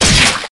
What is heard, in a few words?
weapon hit sword